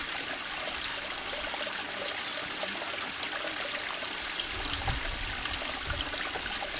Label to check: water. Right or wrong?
Right